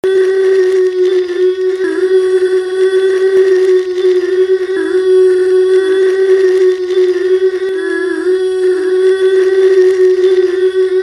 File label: tiger thrush